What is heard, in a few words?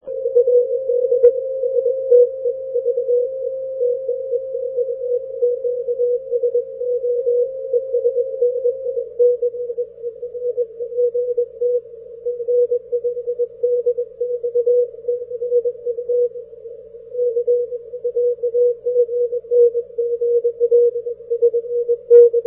40m CW